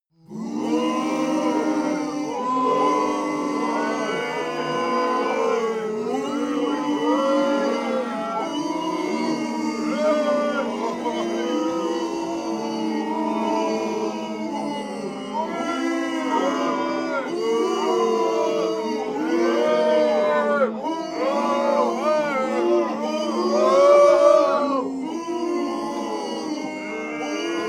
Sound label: male crowd booing